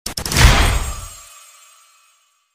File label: survival building removed sound